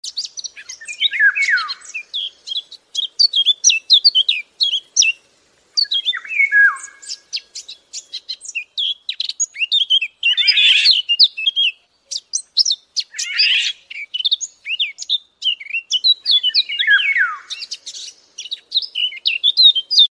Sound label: common nightingale female; برای جلب جف; هزار دستان ماده